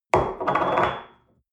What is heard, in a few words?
espresso place on table hard wobble, restaurant cafe